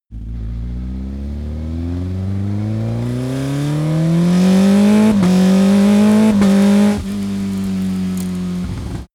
car audi 50 gt fast long acceleration deceleration